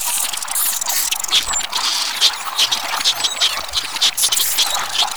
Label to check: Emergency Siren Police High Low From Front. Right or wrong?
Wrong